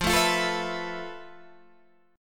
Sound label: F Major Sharp 11th Guitar DADGAD, chord; down; chord shape